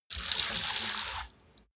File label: roll-down curtain roll up